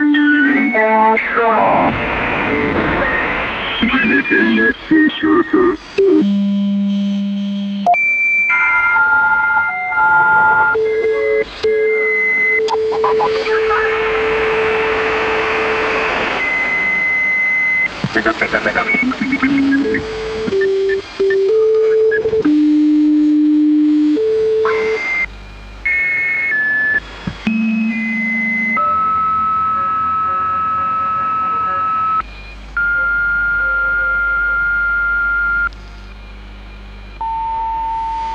changing radio stations

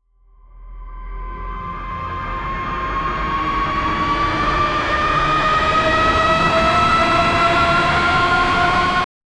creepy horror riser sound

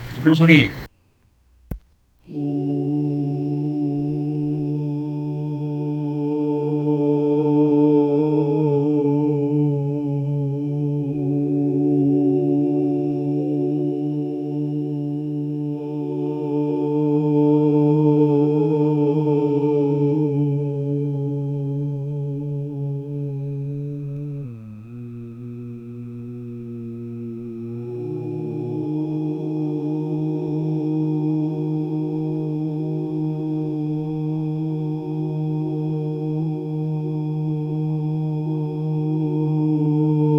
a small group of calm, adult

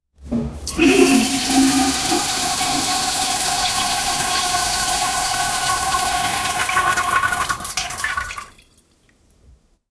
a woman entered the stall, foley

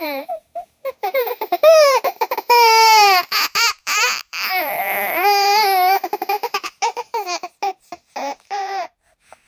laughing ai small baby

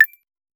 very subtle menu hover sound

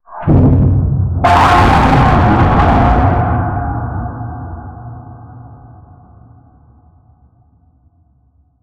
distorted monstrous raspy

